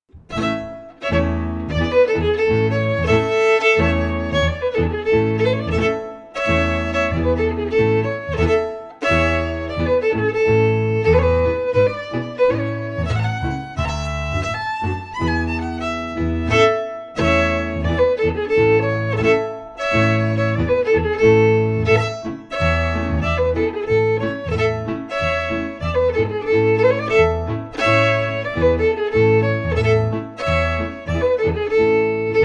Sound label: FIDDLE PIANO; Jink & Diddle Book o' Tunes 2021